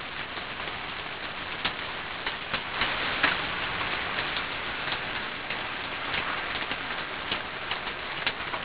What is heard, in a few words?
rain